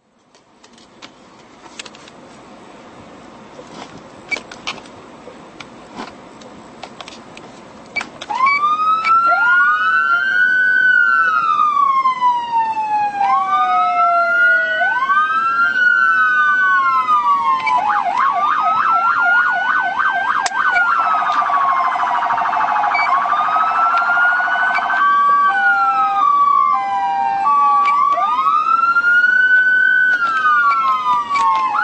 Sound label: rls and whelen beta dual tone siren